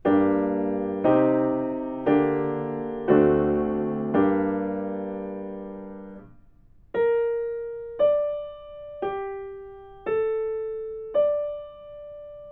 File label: melodic fragments; ear training